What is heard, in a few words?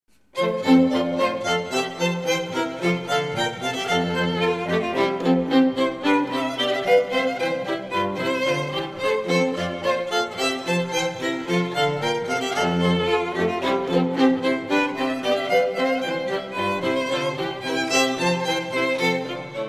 String Ensemble; Rondeau from Sinfonies de Fanfares - Mouret; Classical